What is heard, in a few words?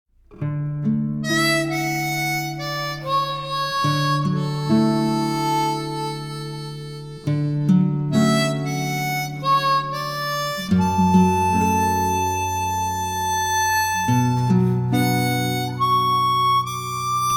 Harmonica; Wonderful Days Original Soundtrack Special Edition; Oodieui